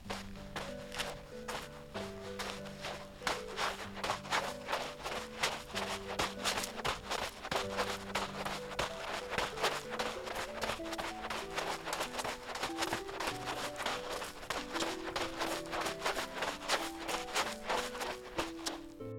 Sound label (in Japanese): 足音 砂, 走る